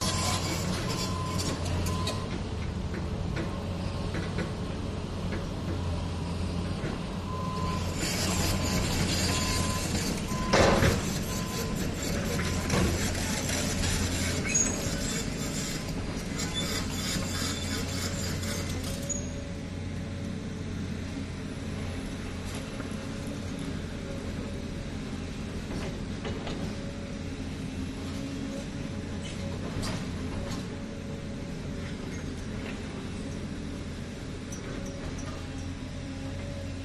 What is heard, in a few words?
excavators contruction site idles and drives; your free sounds